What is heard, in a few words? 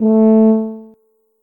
tuba note